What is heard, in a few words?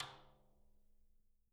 STKRIM, Living Drums